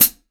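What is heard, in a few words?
MIX foot hi-hat, OLD HH; AKAI S-Series CD-ROM Sound Library VOL-3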